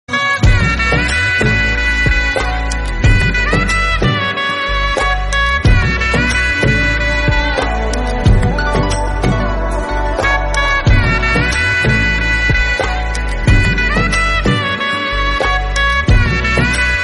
Instrumental

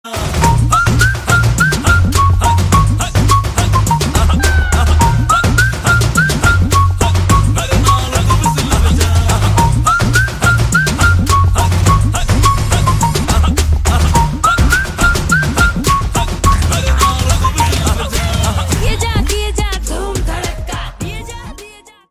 Whistle

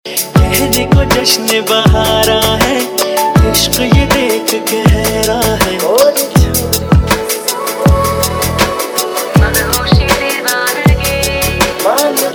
ringtone, romantic mashup tone

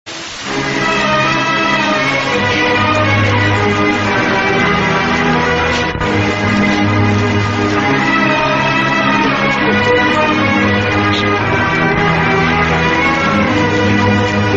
Background Music; Anandam Movie

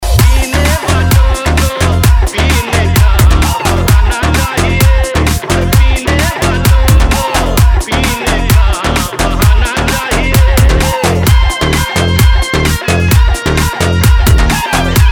ringtone, remix

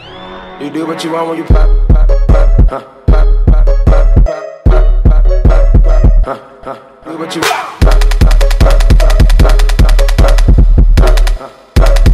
anthem

